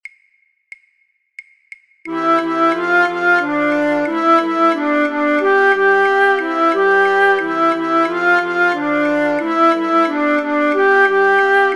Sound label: flute choir basfluit; Branle; voor-oefentrack; Rameau; Platee